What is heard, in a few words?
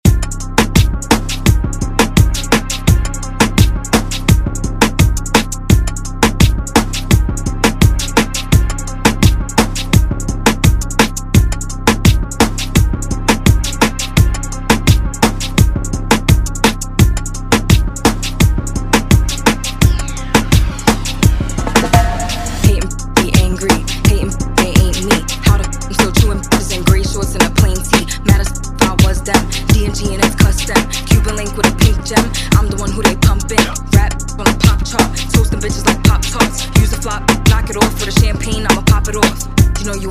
Reggaeton Redrum Intro